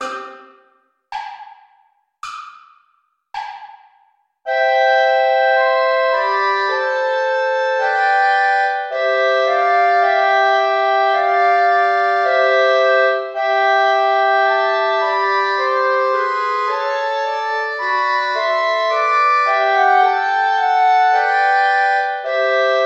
lobet den herren alle die ihn ehren play along without clarinet